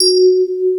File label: Electric Pianos; Wizoo Electric Pianos